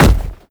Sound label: human bodyfall hard; npc